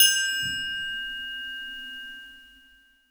triangle opn; Percussion; Sonatina Symphonic Orchestra

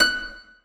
harp f#6; Sonatina Symphonic Orchestra

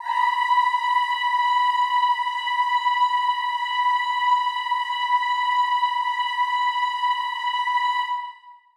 chorus female b5; Sonatina Symphonic Orchestra

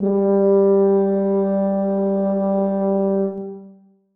horn g3; Sonatina Symphonic Orchestra

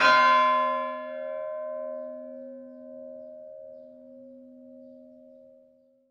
chimes c4, percussion; Sonatina Symphonic Orchestra

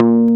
bass

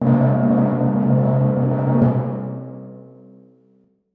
timpani roll c2, percussion; Sonatina Symphonic Orchestra

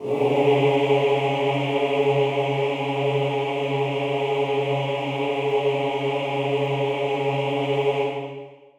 chorus male c#3; Sonatina Symphonic Orchestra

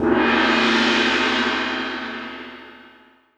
tamtam; Percussion; Sonatina Symphonic Orchestra

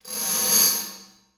tambourine roll, percussion; Sonatina Symphonic Orchestra

